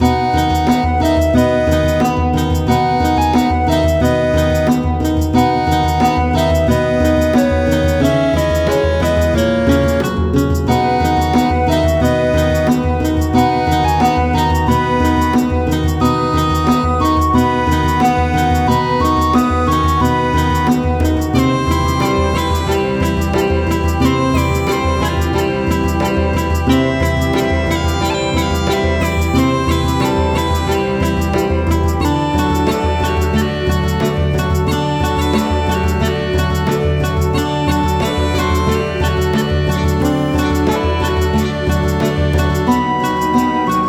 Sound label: celtic forest; ashes of the night